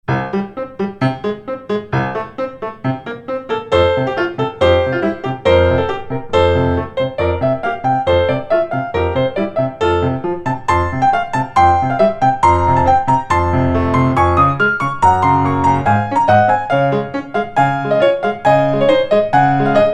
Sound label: battements frappes music for ballet, pianoforte